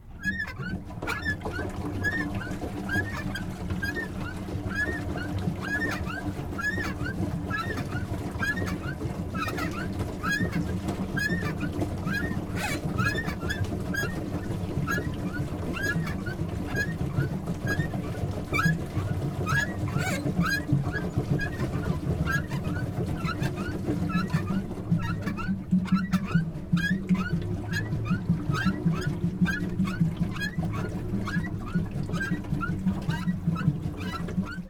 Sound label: Duck boat; anemoi